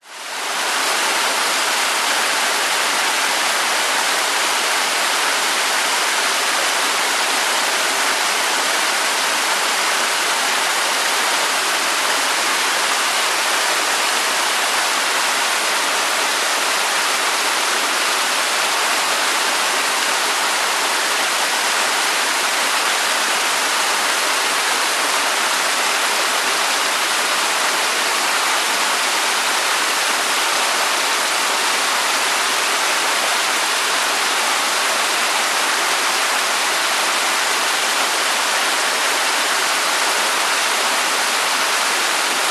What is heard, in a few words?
nature waterfall small into creek forest